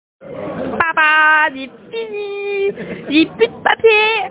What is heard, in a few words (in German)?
papier